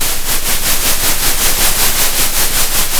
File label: center, Sound of a train